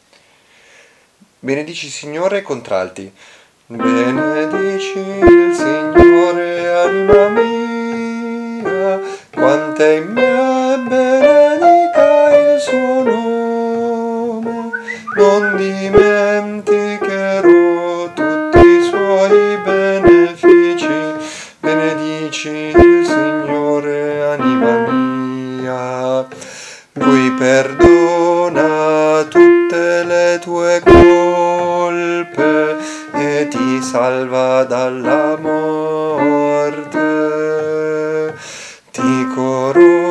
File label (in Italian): contralti; Benedici il Signore anima mia